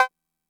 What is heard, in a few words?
cowbell; MFB-522